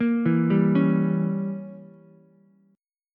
Bb Diminished 7th, Ukulele; arpeggiated; JGuitar

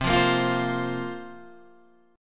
C 7th Suspended 4th, Guitar, DADGAD

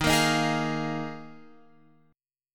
Eb Suspended 2nd Suspended 4th, Guitar, Open F; Ebsus2sus4; strummed

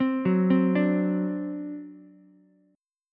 C Suspended 2nd Flat 5th Ukulele, Csus2b5 chord; Csus2b5 arpeggiated

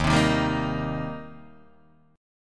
D sharp 6th, D sharp, down, Guitar, Open D

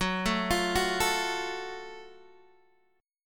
F sharp Minor Major 7th F sharp Guitar DADGAD

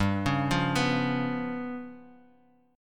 G 7th Flat 5th, G, Guitar, Open D; down

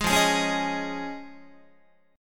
F Minor 7th Sharp 5th Guitar, chord; Fm7#5 strummed; 11,13,11,11,12,14; 4A,4E,5A,5D,5G#,6C#

